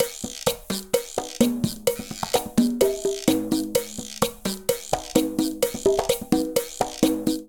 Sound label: Cha-cha-cha 128bpm, Latin Percussion; Ableton; Factory Packs